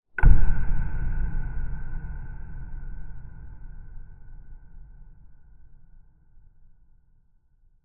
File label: sound design click thud dark reverb horror suspense cinematic